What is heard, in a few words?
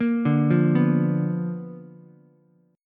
B Minor Major 7th Flat 5th Ukulele; arpeggiated; JGuitar